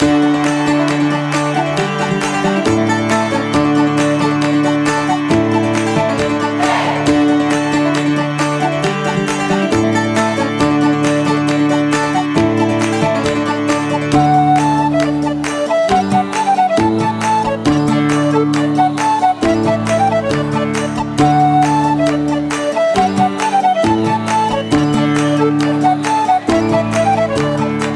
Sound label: bgm swimming; MiniGames